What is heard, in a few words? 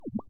fish swim